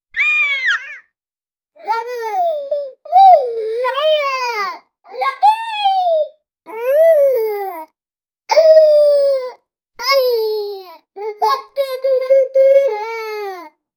a super cute and cheerful, playful

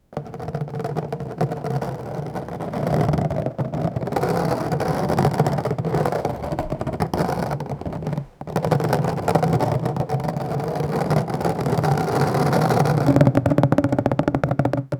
a cluster of balloons, balloons

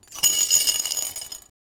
multiple mirror glass rat

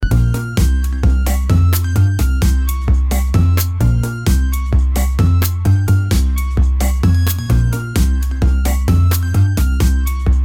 cute fun and energetic mu, energetic; SFX Engine

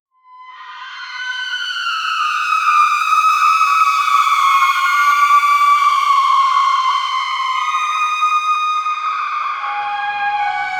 metallic

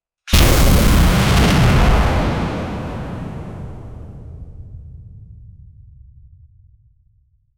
i need a really short, rocket

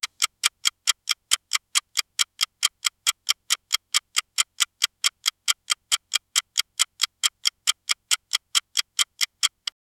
soft tik tik timer quiz

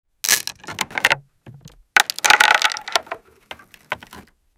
wood

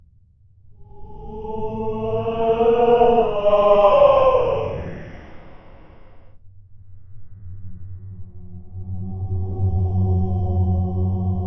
found a place in hell, atmospheric

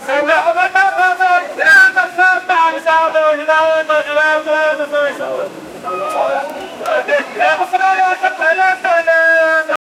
egypt voice crowed in mar

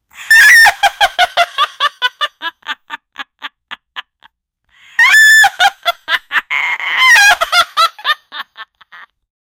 the witchs laugh

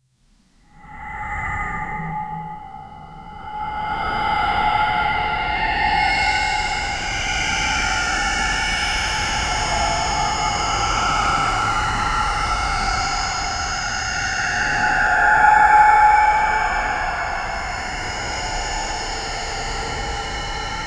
abandoned military bunker; abandoned places

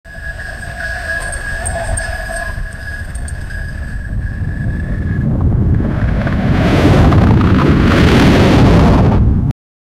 roller coaster and wind, amusement park

